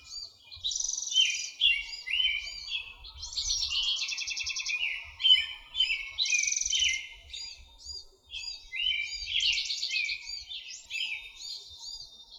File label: moving bird Loop